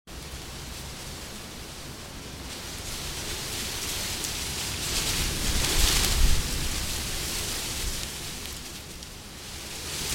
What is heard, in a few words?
soft wind